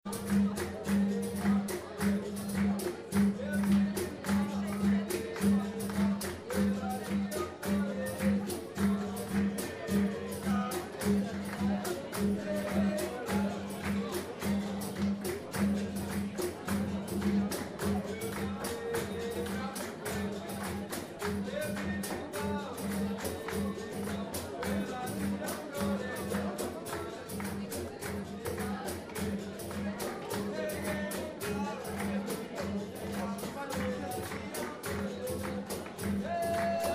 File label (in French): Ambiance Capoeira; Aventuraid 2010